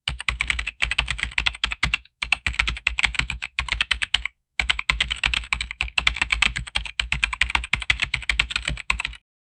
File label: mechanical keyboard typing